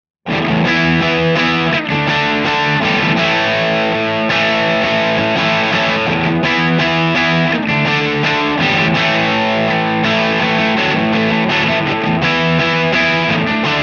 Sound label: crunch; jensentone